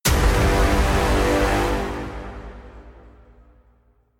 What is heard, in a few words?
stab big brass g